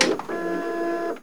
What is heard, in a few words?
on off, Switch FX; Masterbits